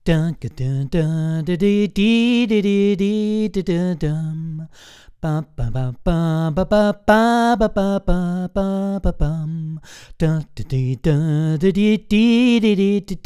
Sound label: Alpha neutral